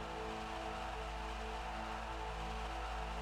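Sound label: free spin Reel Spin Loop; wild water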